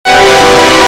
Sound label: suspense loud